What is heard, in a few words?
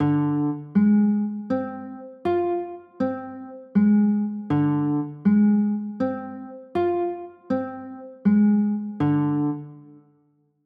a sharp 6 9, chords